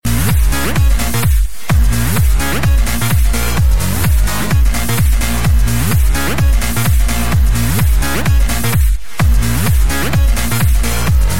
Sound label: Bass Test Tone